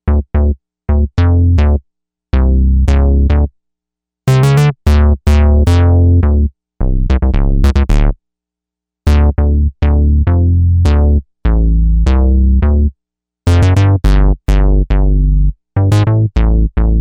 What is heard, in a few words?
Bass; Novation UltraNova; Factory Patches